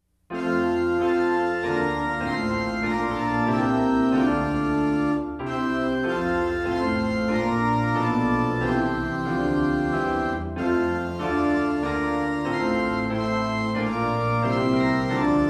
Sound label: SDA HYMNAL SONGS TUNE